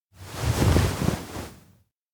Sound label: futon hide under; anemoi